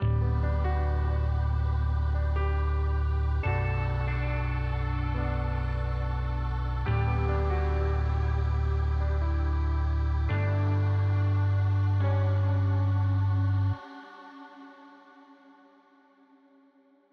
KONTAKT LIBRARY SONG INTRO FULL

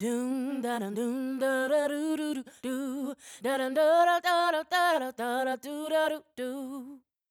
VOCAL ONLY; R verb off

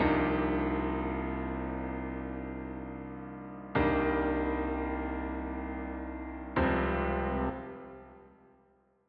PIANO RVERB ON

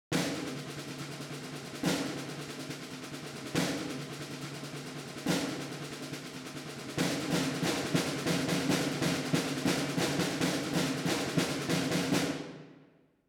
Kontakt library action strike plugin off

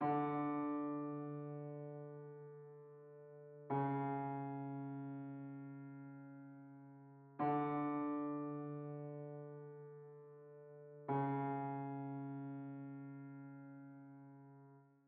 ELECTRO ROCK PIANO LOW

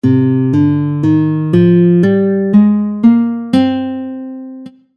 C Minor Scale

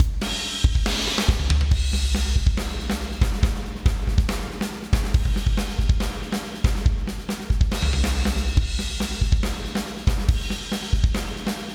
DRUMS ONLY; KONTAKT LIBRARY

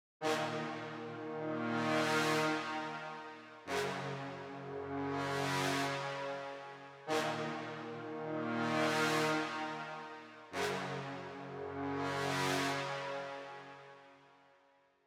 BRASS ONLY WET; KONTAKT LIBRARY

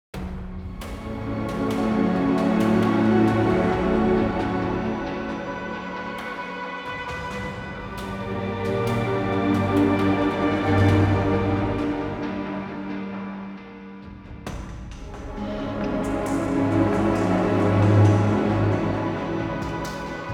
KONTAKT THIRD PARTY SONG FULL

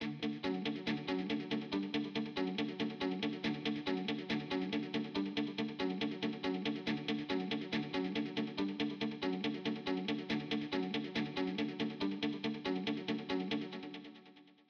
INTRO GUITAR; KONTAKT LIBRARY

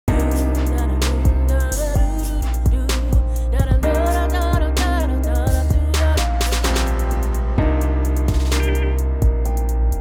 song reverb off

